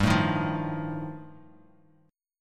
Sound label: G 7th Suspended 2nd Sharp 5th G, Bass Standard; strummed; JGuitar